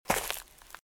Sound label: Footsteps - Leaves - Walk - Left; RadwinIsland